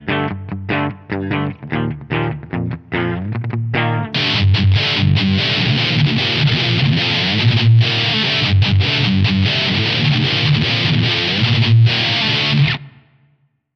solid metal; Damage Control

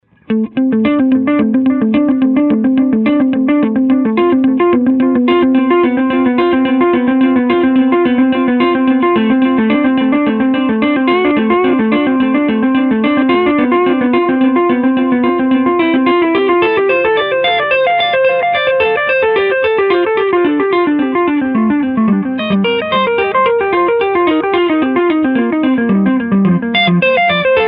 delay, Analog Delay; Retro Sonic

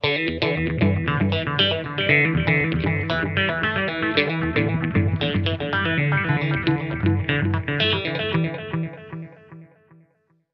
phase delay; Damage Control

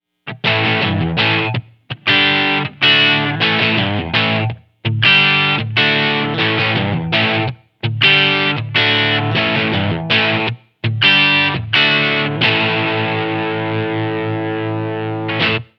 demonizer; demonizer junior od; Damage Control

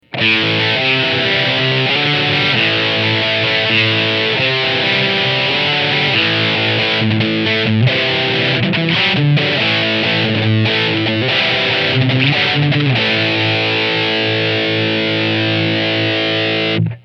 demonizer sizzle; Damage Control

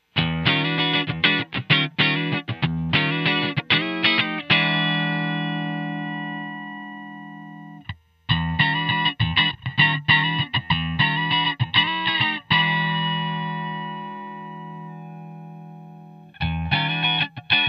timeline reso eq; Damage Control

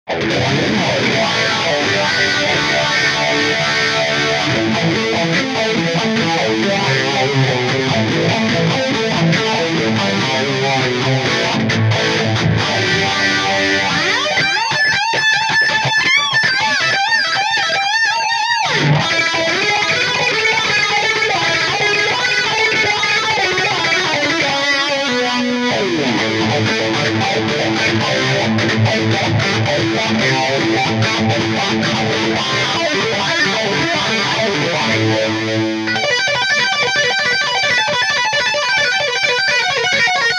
phase 90; MXR; evh90

